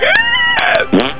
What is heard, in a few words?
splat